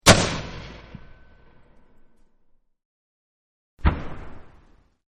Mortar Fire Explosion, Mortar Single Fire with Explosion; VRSonic; Guns